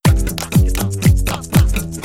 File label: Sfx Countdown Loop; rayman jungle run android ios mobile windows gamerip 2012; Lums